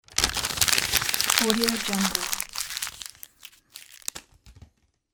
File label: crumpling paper and throwing in basket